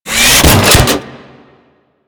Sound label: Drone Latch On; Warframe; Vay Hek